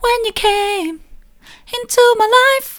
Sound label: soft, vocal